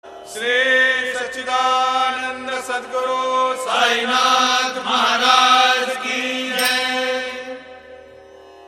Om Sai Mantra

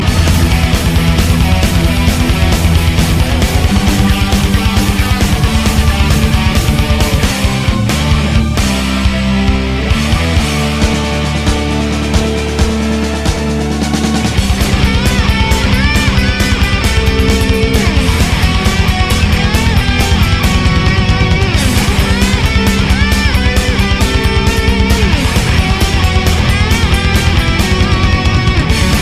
Power Metal

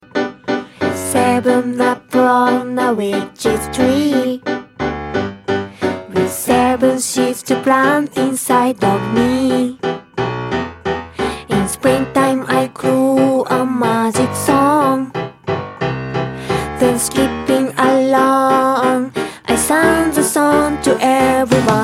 Wolf Whistling Song

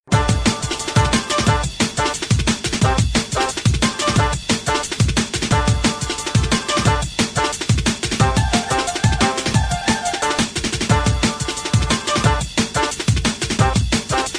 Chinese drum n bass

